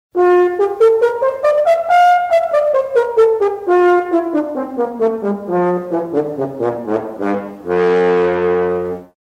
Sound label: french horn